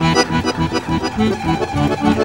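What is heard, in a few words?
accordion groove; Best Service; World Colours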